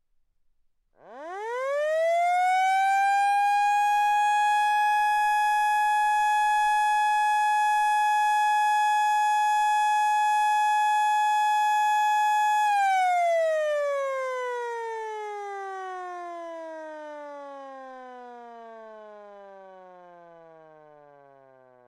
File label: Sound, siren; Iransiren S20